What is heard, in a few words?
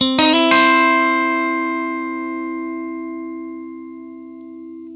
blues guitar riff 120, Vintage Blues Guitar; USB Soundscan vol.22; AKAI